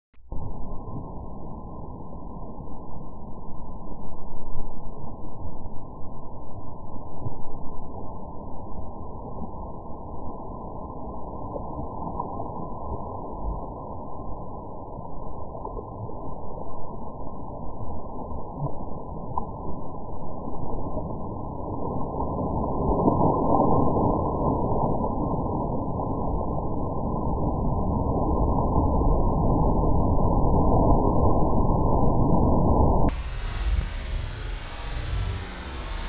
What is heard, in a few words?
Beach Wave